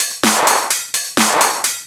Straight Loop 128 BPM, House

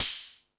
More Cymbals, E-MU Pro-Cussion

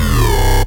Basses Root C

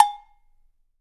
Cow LP top, Cowbells; Big Fish Audio; Ross Garfield Drums VOL-2

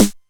909 Snare, Novation Drumstation; Novation DrumstationV1

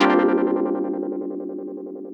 CHORD -L, Drum & Bass, 180 BPM; Ueberschall; Drum & Bass Resonance

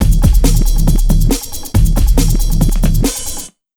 Big Beat Drumloops, 138 BT

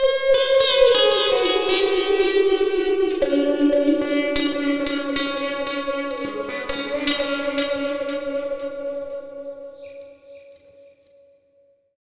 Gtr G#, Slo Mo Funk, Lounge; Zero-G Kocktail Kollection; Construction Kitz (Lounge)

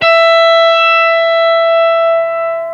GTR SOLO, ROCK GUITAR; Greytsounds VOL-1; ROCK GUITAR1

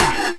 Percussions

